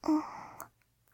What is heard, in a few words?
moan; Whorblox; ROBLOX GAME ASSETS